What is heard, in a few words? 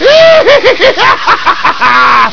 haha